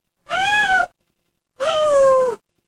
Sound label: animal cat meow